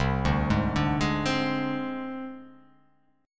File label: C Suspended 2nd Sharp 5th, chord; Csus2#5 arpeggiated; JGuitar